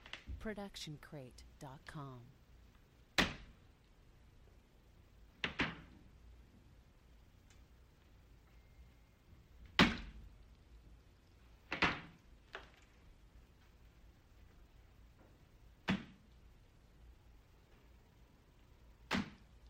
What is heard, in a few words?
footfall wet steps; soundscrate